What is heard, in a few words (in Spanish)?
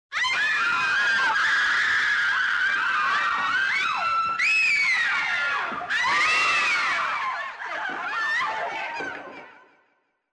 grito de mujeres asustadas; SONIDOS TERROR